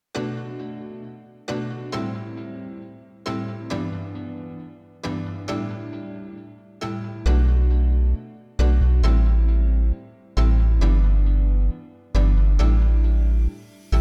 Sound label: Bling, EDM Pop